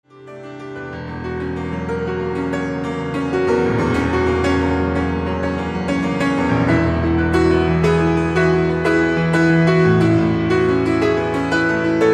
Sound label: Improvisation